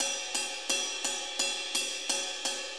4-4 Ride Loop; Drum Tools; 4-4 Drum Loops; HiHat & Ride Loops

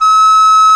RECDR C; Roland - LA Composer vol. 1

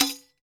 Rek Khana Closed; Roland BK-5; Percussion

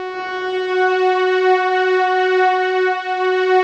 rich mellow, Rich Pad

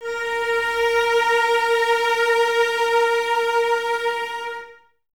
CES A#4, String Ensembles Cellos; Miroslav Vitous; 90 s Sample CDs

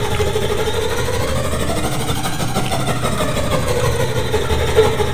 WIND L, Piano; InVision Interactive; The Piano

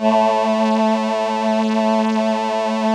VP-330, String Machines

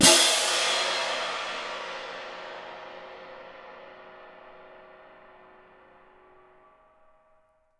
CYM MF, Percussion; Miroslav Vitous - Percussion & Harp

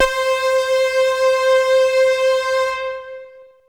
Techno leads, hits and riffs

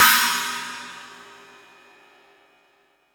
Fusion Stix, Drum Machines

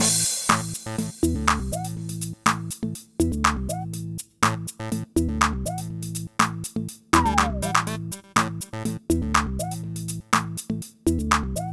122 bpm, Old Skool House; Big Fish Audio; OSH Construction Kits; OSH Organza; Organza Demo

